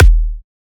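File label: hardcore Kick E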